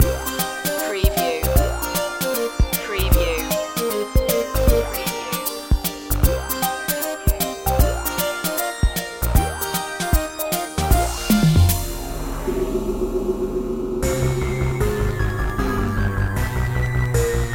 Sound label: retro video game pipe world loop